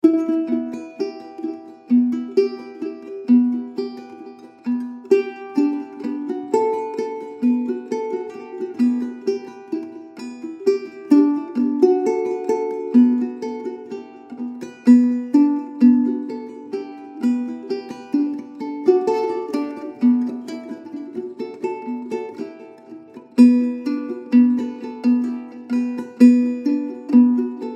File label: RONROCO